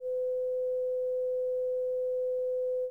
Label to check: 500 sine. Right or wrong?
Right